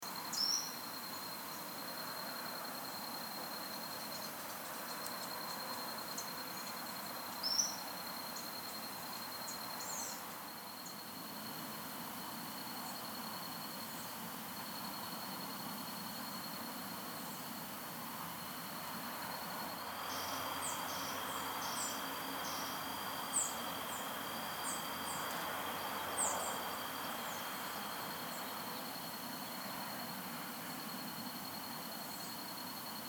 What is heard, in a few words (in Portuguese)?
SONS DA NATUREZA CERRADAO; JARDIM BOTANICO